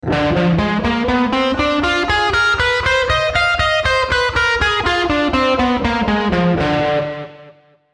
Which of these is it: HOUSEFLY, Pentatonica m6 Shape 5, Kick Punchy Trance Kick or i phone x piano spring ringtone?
Pentatonica m6 Shape 5